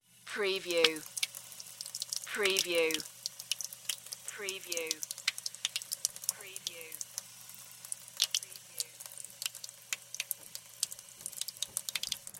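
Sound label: Laundry Faucet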